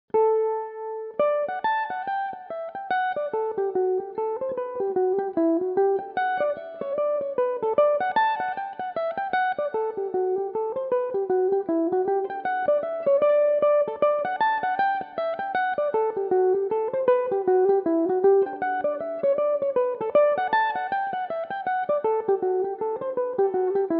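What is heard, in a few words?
D major, mandolin; Scholar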